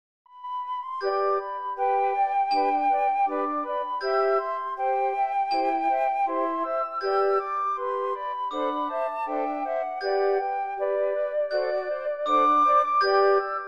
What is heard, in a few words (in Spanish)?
Serenade, Flauta Solista y grupo de 4 flautas con glokenspiel; J. HAYDN – SERENADE; Op.3 Nº5